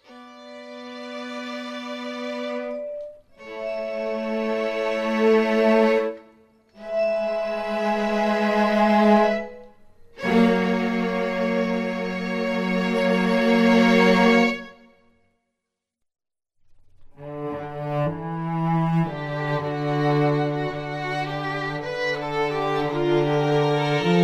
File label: Strings and Bowed Vibes Mix